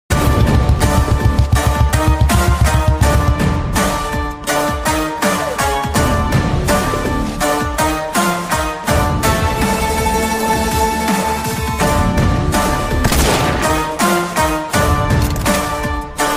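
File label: mass background music ringtone; shekar glimpse; shekhar movie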